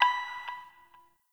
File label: hack minigame touch node; Warframe; Duviri